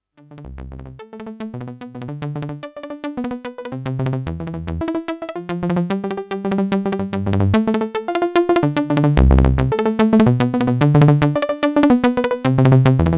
looping delay

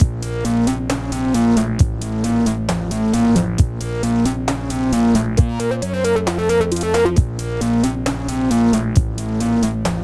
remix 134 BPM

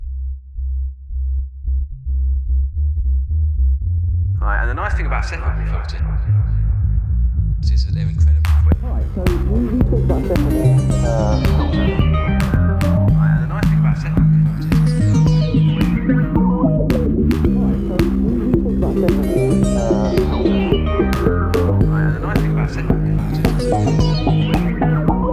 Separable Filter

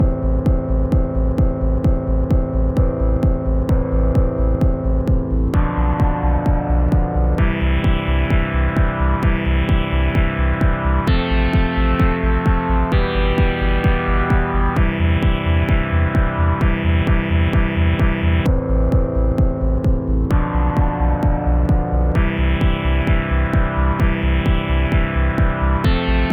Xmas Remix

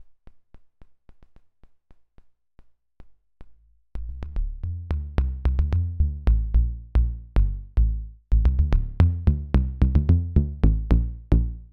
daft bass

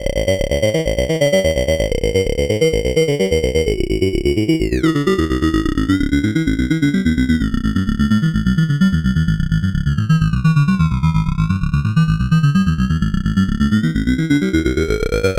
Random Loop Low Pass Filter

